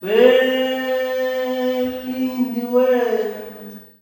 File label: "WEN-LINDI", MALE; Spectrasonics; Heart of Africa 2; S.MALEAMB